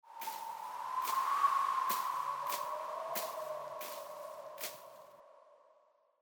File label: Tumble weed blowing; افکت صوتی